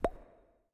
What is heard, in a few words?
reel bounce; reef raider